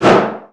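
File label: Collision Interior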